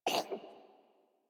Kitten Cough Hairball; Enemies; Warframe; Catbrow

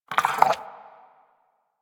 Armadillo Vocal Idle; Characters; Warframe; Hunting Critters

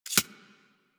Arachnoid Micro Step; Warframe; Corpus

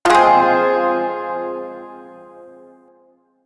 Shawzin Phrygian Chord; Music; Chords

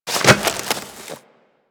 Roll Ice; characters; generic foley; Warframe

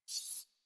UI Lock On; Player Ship; Warframe; Railjack

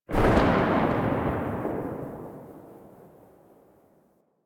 Archgun Charged Tail; Warframe; Archwing

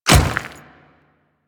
Dirt Step, Footsteps; Royal Guard

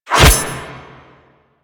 Machete Whoosh; Warframe; Weapons; Melee; KahlMachete

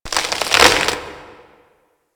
Hub Tree Creak; Warframe; Tenno

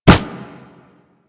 Kubrow Run Snow, Footsteps; Enemies; Warframe

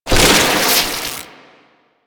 debris fabric; ambience; Warframe; Entrati

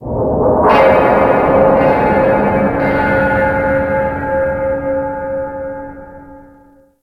Pipe Drop; Ambience; Warframe; Grineer Galleon

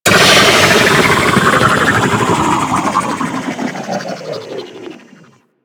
Movement Wind Down Long, Vehicles; Warframe; Archwing Demolition

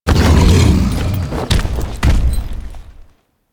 stumble; DE; Warframe; Warframes; Grendel

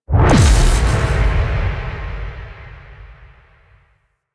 Space Blackhole Teleport In; Warframe; Railjack

